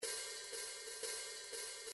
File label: swing cymbals 1 bar, midi